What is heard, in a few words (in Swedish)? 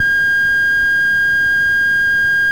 Telefonsvararpip